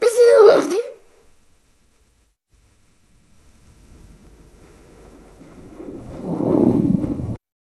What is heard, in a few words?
jingle